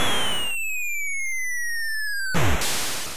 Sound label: Miss